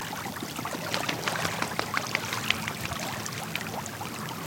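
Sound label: double-crested cormorant takeoff sound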